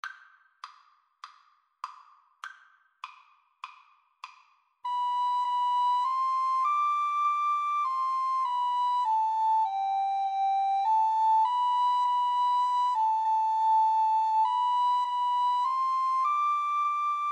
ode to joy, Recorder Duet

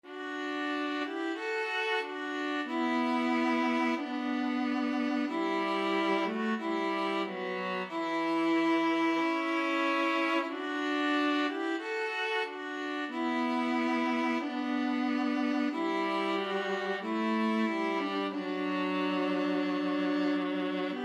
violin viola duet

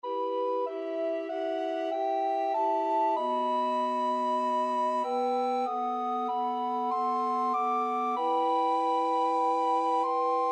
el cant del ocells, recorder quartet

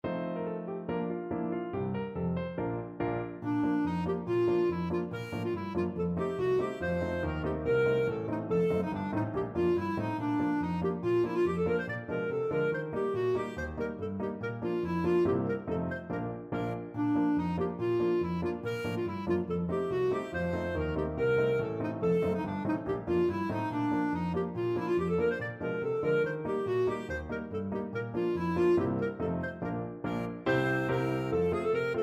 Jesusita en Chihuahua clarinet

